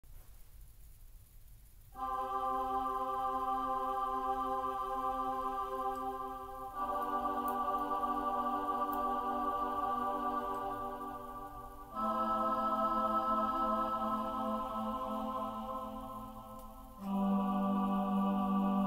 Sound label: Te Deum Hymn Choral Christmas Bells in C maj